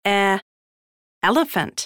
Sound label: ELEPHANT